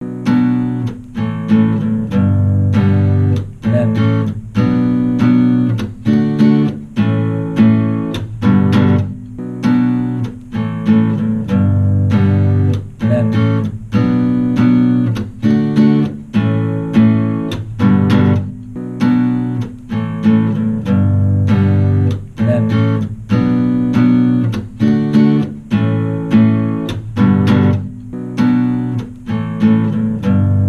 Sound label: guitar solo; Waves on the Sea; MoonTorrent Music Productions